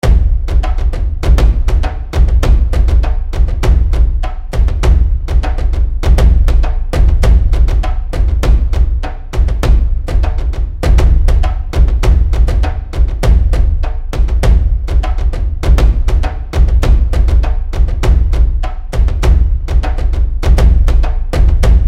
War Drums Loop; Musical Sound Effects

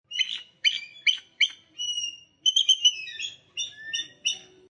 Two Eagles Squawking And Barking Excitedly

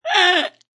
Funny Laugh Sound, A hilarious laughing sound; Laughter (Sound Effects:Voice)

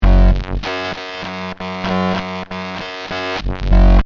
Hit Synth Delay; Hit Sound Effects; Sound Design

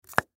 Rifle Round, Rifle Round Place In Holder; Fast Hard; Warfare; Blastwave

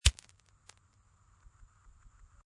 Lighter Light Gas Hiss Soft; Flick Sound Effects; Lynne Music

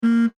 Industry Hi Lo Horn Beep; Factories; SFX Bible

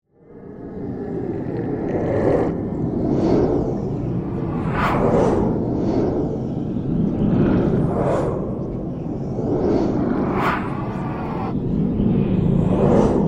Magical Spell Whoosh; Horror; Whooshes; BlastWave

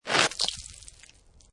Snow And Ice Ice Scrape With Ice Fragments; Weather

